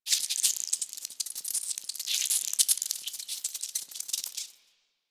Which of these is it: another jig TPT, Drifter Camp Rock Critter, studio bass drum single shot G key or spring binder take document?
Drifter Camp Rock Critter